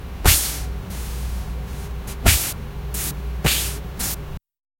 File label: swish swish paintbrush